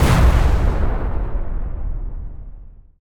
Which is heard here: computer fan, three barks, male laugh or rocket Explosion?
rocket Explosion